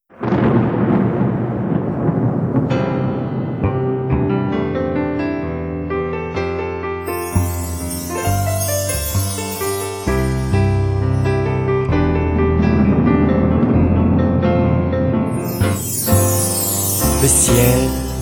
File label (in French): ORAGE